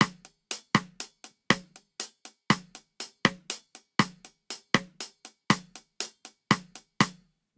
Bossa Nova Snare & Hihat 120 bpm; 4 4; MIDI Clips; Ableton; Factory Packs; Drum Booth

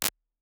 Ring Snareverb, Drum Reverbs, IR Samples; Convolution; NI Kontakt 5

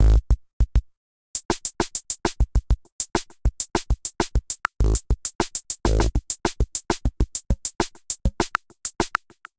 100 Breakdown; D55 Modewipe; Ableton

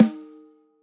SNARE Low stick off center

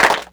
Clapbox Pitch, Clap Box; Ableton Foundation FreePacks

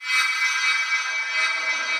Metallic Texture, Dark Textures; Instruments; Ableton; Mood Reel

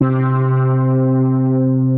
Synthro Keys; Ableton; Creative Extensions; Racks

